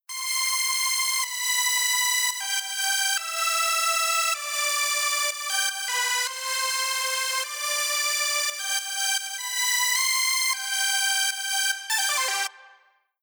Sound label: FutureBass Mix Melo Lead; REQ On